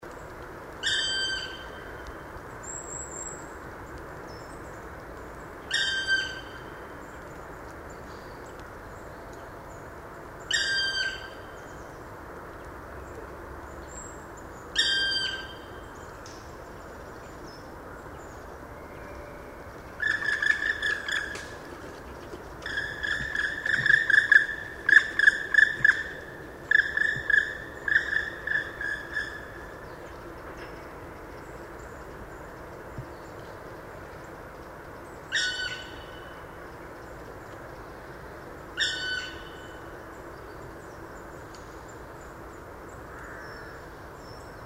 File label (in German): Schwarzspecht; Hofgarten Landshut LA; Susanne Rieck